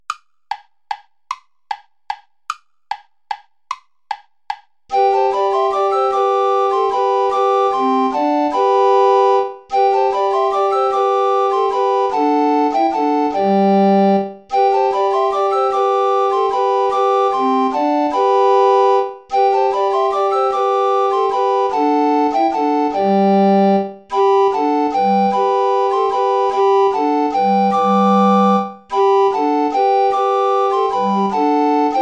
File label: volta play along without tenor recorder; praetorius michael